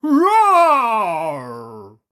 dino roar; SyrinScape; Syrinscape unplugged; Jungleplanet dinolaser battle

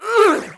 moan

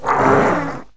moan; The Elder Scrolls III - Morrowind

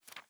paper turn page; Papers Please

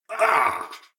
Combat; Eshimal; SyrinScape; Snarl and Oraculum

